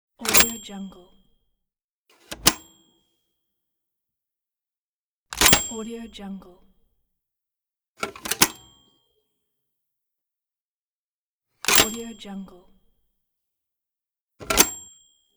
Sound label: cash register open close